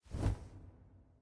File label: flap; smite; kukulkan